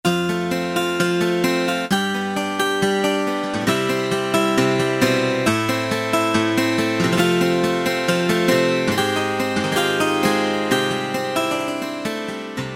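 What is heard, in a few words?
12 string Acoustic Guitar, Roland U-110; Factory Patch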